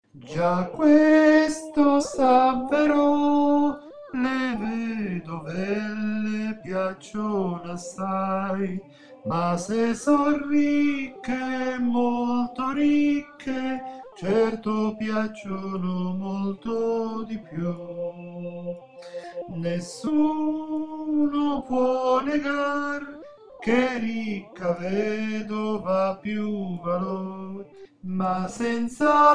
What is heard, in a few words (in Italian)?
operetta alti